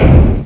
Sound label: stone fall